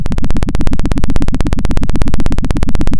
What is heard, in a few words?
Sound of a helicopter